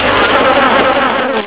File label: bee